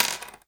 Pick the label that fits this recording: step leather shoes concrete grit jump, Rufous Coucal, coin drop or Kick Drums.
coin drop